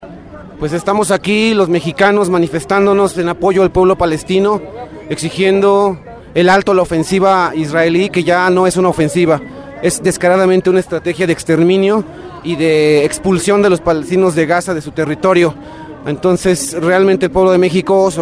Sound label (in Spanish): marcha; palestina julio 2014